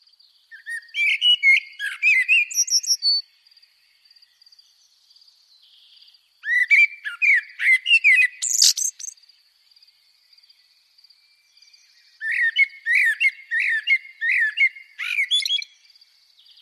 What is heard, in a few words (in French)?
Merle noir chant